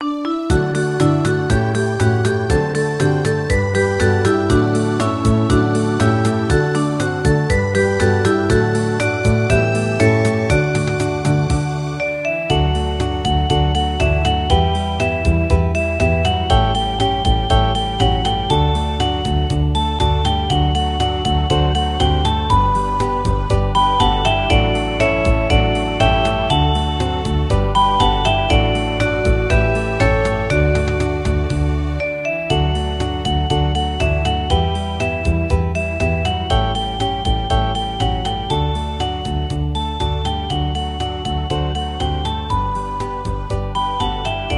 kinderlieder playback

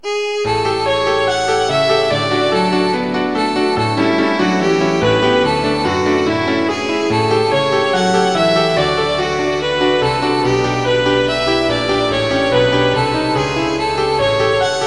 violine u klavier; kinderszenen; glueckes genug

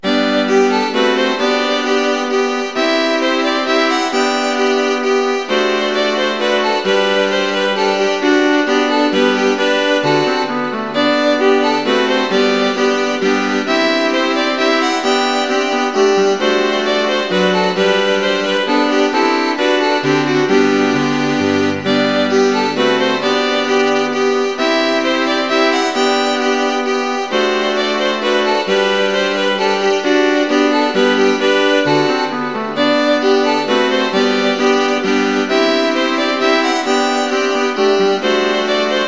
g dur streichquartett; bach menuett

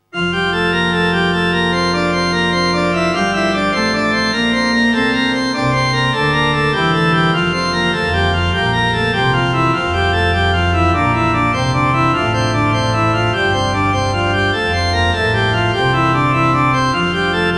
nun danket all und bringet ehr, orgel

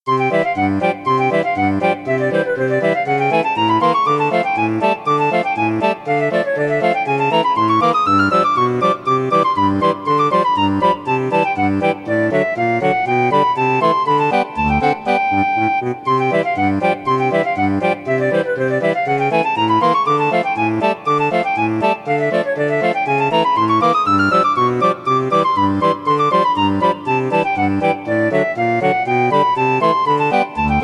zirkusmusik

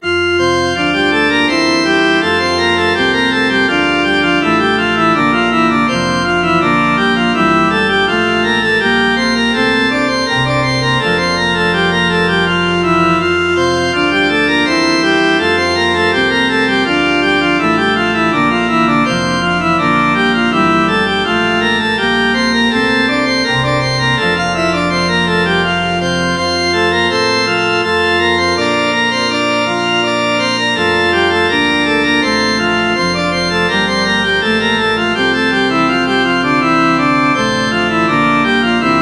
lobe den herren, orgel

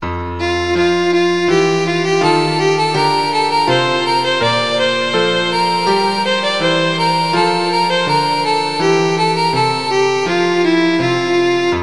violine u klavier; spielmusik

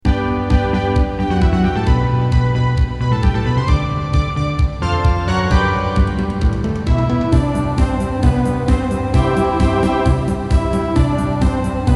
zirkuslieder zirkuslieder hitmix playback

